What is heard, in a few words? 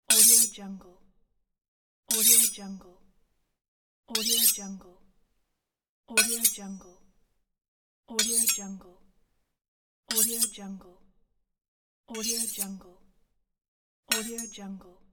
knife; ponyrai